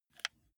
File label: console controller joystick move single